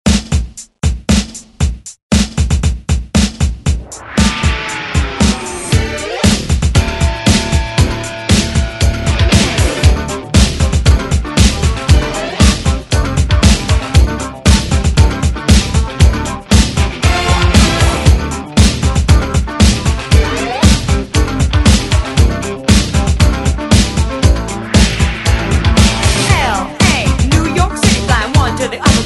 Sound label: 80s ReDrum 116 Bpm; DJDOLLS